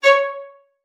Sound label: VIOLIN SPICC, C#5; Xsample VOL-5